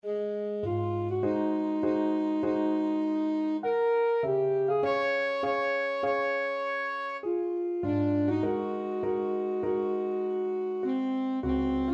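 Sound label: prelude alto saxophone; chopin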